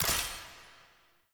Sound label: Menu Color Select; Warframe; Awakening Remaster